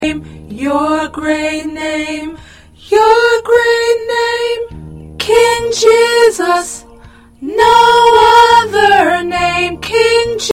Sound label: P Alto